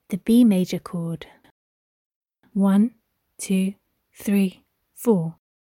C maj chord

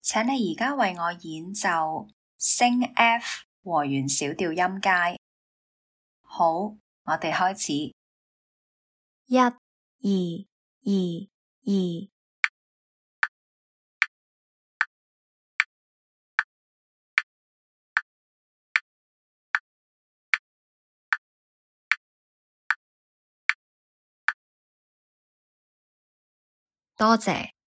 f sharp min harmonic scale

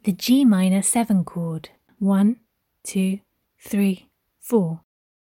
g min7 chord

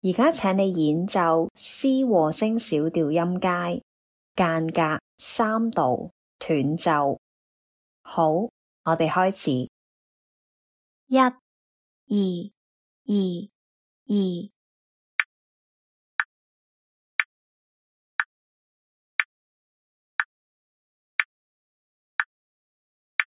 c min harmonic scale 3rd apart staccato

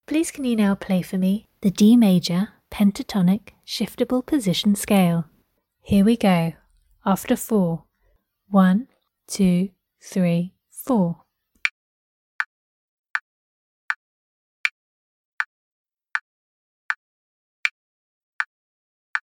d maj pent shift

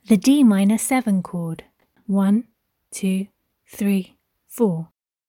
d min7 chord

